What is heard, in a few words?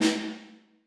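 snare left hand p, percussion; Sonatina Symphonic Orchestra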